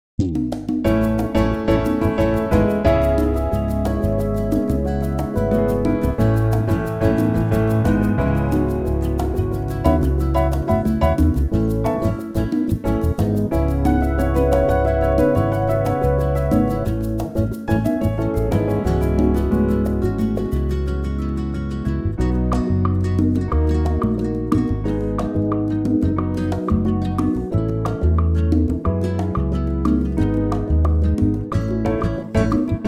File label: bossa B, Male Backing Tracks